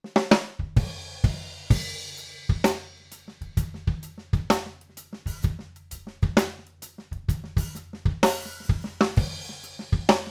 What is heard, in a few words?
OH Spaced Fig 8 HPF, overheads, drum; drum shootout